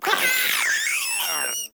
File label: Maggot Bark; Enemies; Vocals; Warframe